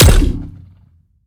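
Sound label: Sci weapon Shot Medium Gun Warp Sniper; Robobeat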